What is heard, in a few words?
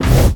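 attack blunt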